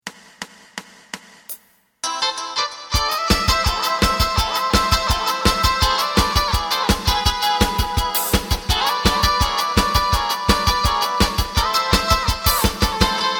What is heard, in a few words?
bass; エレキバンド用カラオケ